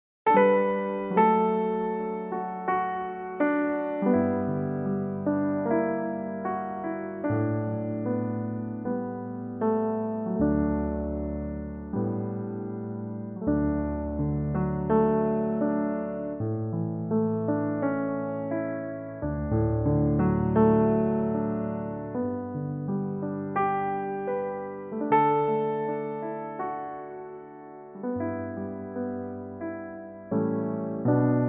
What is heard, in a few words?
piano cover; 18 worship songs